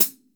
MIX FOOT, STUDIO STEREO HI HAT

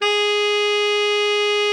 TENOR, TENOR SAX; Best Service; Killer Horns

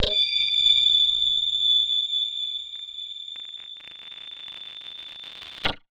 Casio, Glitched, malfunctioning synth

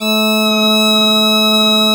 BP BASS E3, Celtic; USB Soundscan vol.42 - Celtic Flavours; AKAI

